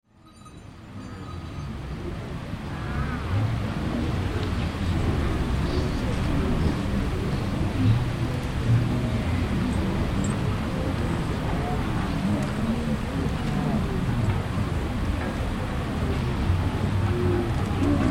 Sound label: County Show Ground Atmosphere, Background Tannoy - Dogs, Chatt; Outdoor Sound Effects